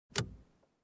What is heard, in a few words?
Blinker Lever Click